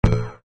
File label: chat